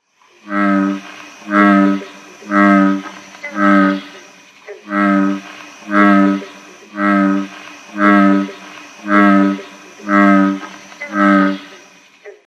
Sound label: Bullfrog